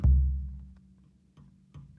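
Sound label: Calm Far, music; From Dust; PS3; Xbox 360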